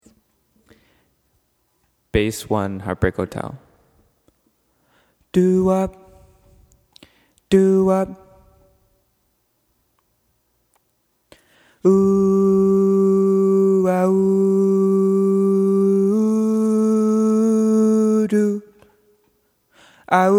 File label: bass one